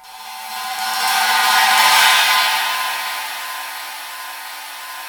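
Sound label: Zildjian K 20 swish, cymbals; InVision Interactive; Lightware VOL-1; Instruments & Percussions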